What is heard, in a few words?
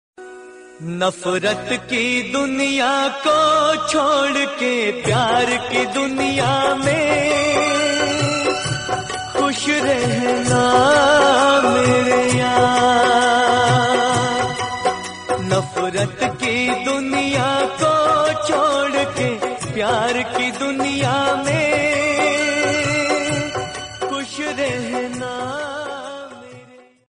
old emotional hindi